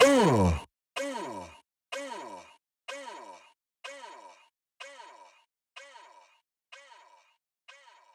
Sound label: "Uuh", EDM Future Vocals; Big EDM; Future House Tools